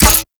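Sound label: Full Draw Mech, Weapons; Infested; Cernos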